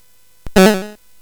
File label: Space Gun